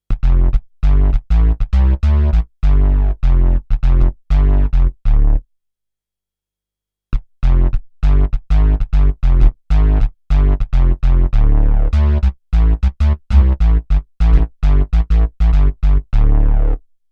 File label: V50 Bass